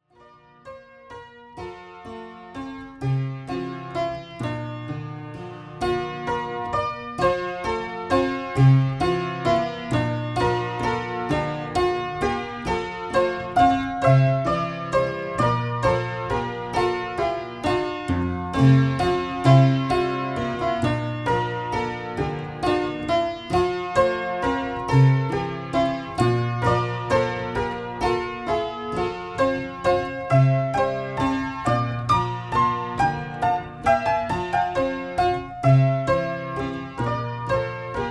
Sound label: snack bar piano